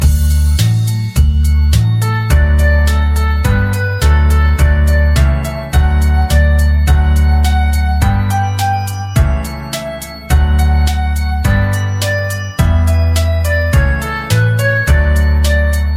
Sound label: b i n g o ringtone